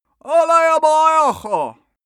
male shout arabian

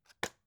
drug package drop deep soft; berufe; arzt

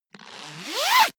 zipper pullover jacket open accelerate; objekte; reissverschluss

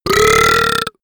monster burp; comedy-cartoon; soundpool

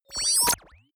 confirm sweep, signale; soundpool

